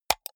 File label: computer mouse right click hard